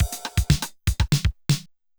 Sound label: Loop, 505; classic machines